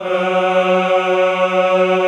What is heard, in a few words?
CHO, Roland